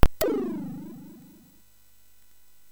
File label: Fuzzball, MOS6581R3